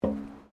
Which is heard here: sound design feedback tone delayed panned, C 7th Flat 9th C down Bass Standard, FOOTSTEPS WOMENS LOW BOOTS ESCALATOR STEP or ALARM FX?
FOOTSTEPS WOMENS LOW BOOTS ESCALATOR STEP